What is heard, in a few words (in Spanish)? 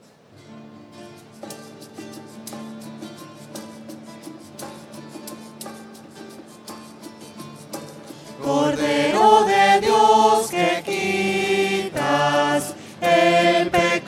cordero de dios voces; mejia